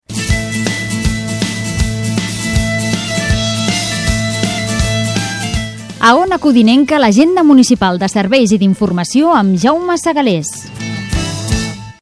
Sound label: JINGLE